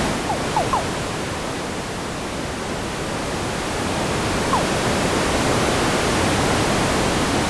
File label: ponds